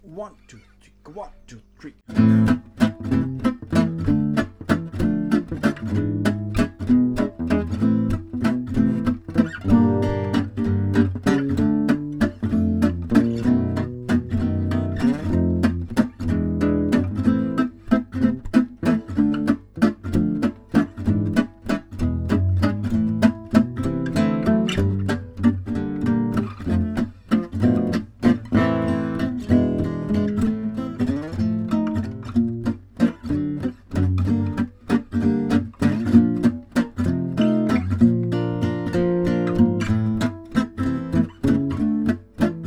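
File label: backtrack minor waltz guitar bass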